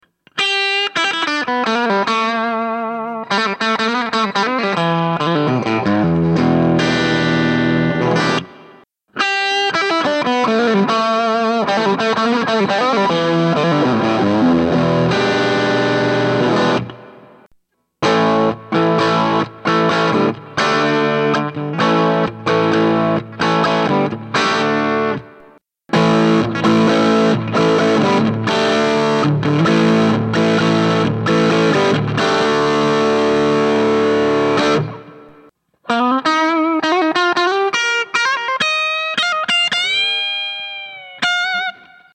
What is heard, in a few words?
TS808 Clone